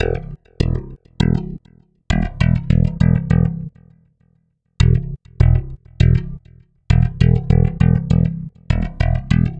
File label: Stick Loop, Stick Bass